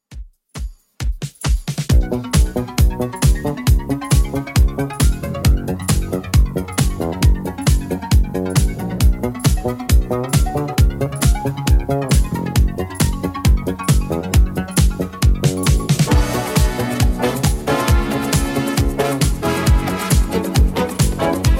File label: 70s Redrum 135bpm